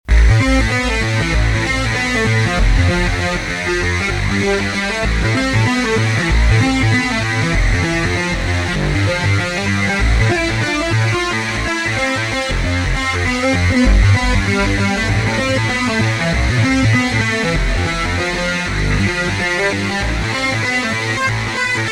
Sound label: HARD SYNC